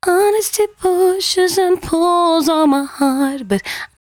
113bpm C#, Velvet Vocal; Zero-G

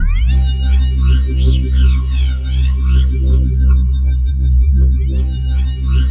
C2, SynthLine; 90 s Sample CDs; NiceBeats; SynthLine VOL-5

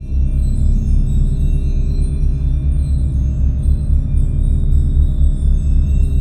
drone, Pipe Clusters; E-MU Producer Series Vol. 5 – 3-D Audio Collection; 3DSFX

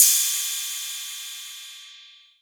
808 Cymbal; Novation Drumstation

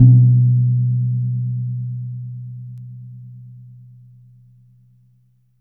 JAVA GONG B2; Spectrasonics; Heart of Asia

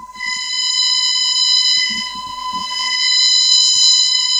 VOCO CHOR C5, VOCODER CHOR; Q Up Arts; Dream Experience

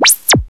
ZAPPO; TECHNO-INDUS; Greytsounds VOL-1

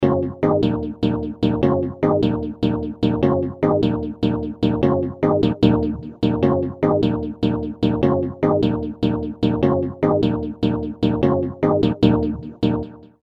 Plonk Riff, Chords; Industrial

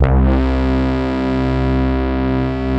ARP, Analog Fantasies

